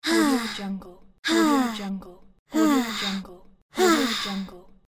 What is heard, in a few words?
female child tired